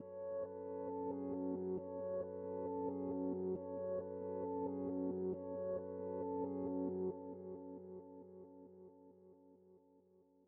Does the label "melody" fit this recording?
Yes